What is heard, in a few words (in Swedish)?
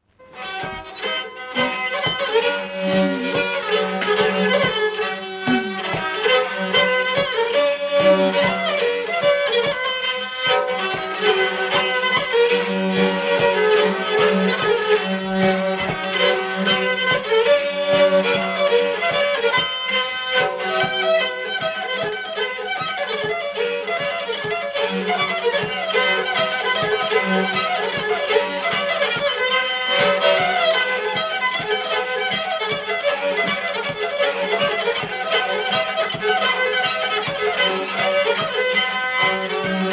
Nyckelharpan